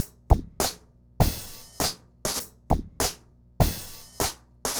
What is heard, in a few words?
Remixed Drums 100BPM; The Roots Drumloops